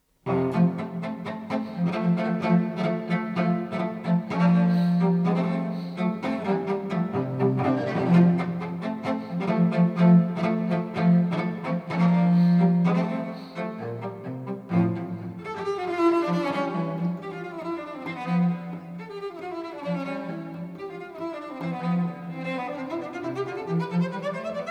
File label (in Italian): Allegro Adagio, Sonata in si b. magg. a violoncello e basso; Concerto G.482; G565